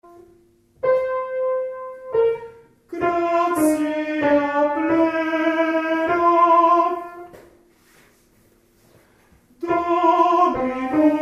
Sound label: Tenors; Ave Maria